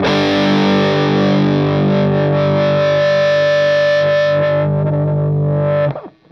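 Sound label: fuzz guitar vibrato; MAYFUZZ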